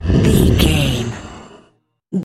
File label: troll monster effort growl small